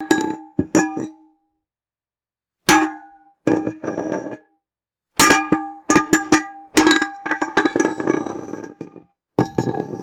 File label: hollow metal mug dropping and settling